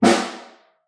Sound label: Snare Far